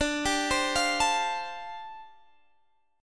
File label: G 7th Suspended 2nd Suspended 4th D chord